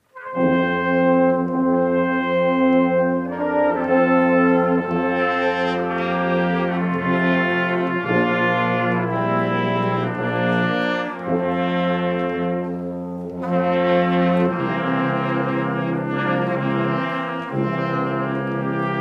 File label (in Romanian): Fanfara